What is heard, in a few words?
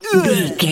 voice male grunt pain